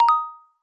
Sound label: hudson ringtone, sms ringtones